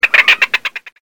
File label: chicken